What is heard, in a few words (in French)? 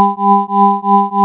scie